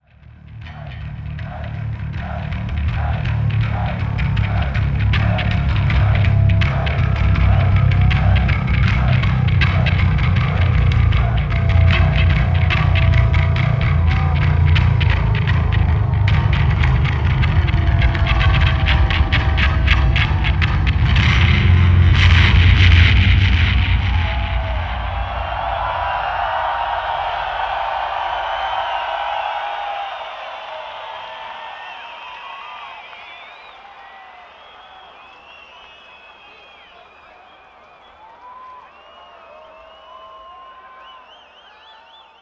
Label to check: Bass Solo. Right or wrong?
Right